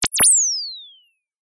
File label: science fiction display monitor switch off high pitched whine